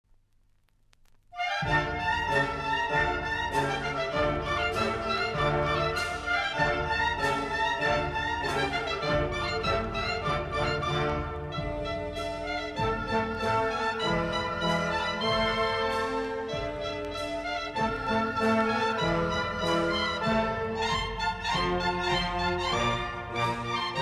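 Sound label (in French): Pas redoublé en Ré mineur, harmonie militaire; (Gebauer); Anthologie de la musique française pour harmonie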